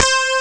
strat; ST-XX Amiga Soundtracker Sample Packs

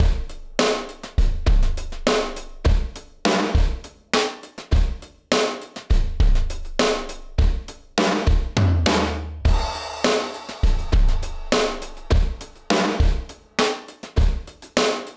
Drum Break; Trip-Hop; Loops; Sonic Foundry (Sony Creative Software); Rondo Brothers Trip-Hop Loops & Samples; Liberation

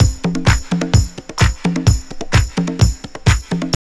house loop

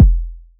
909 BD; Goldbaby Tape808 & Tape909; The Tape 909

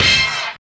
RETRO HIT, Synthy Tonal; Distored Reality

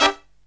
LOW CHORD, Killer Brass Riffs; USB Soundscan vol.29; AKAI

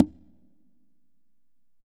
CONGAS WET; Q Up Arts; Denny Jaeger Private Collection

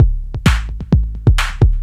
low loader, Deep House 130bpm; Zero-G Deep House

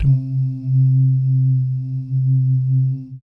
BASS DUMMS, Vocal Planet Jazz FX; Spectrasonics

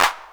Clap, Boss DR-110, Drum Machines; 300 Drum Machines

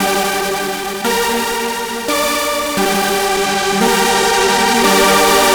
Melody, Drum n Bass; KJ Sawka; Drum n Bass & Beyond

